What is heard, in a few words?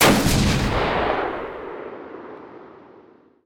largerifle firing